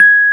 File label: G#5, Electric Pianos; Wizoo Electric Pianos